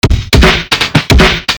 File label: weird druums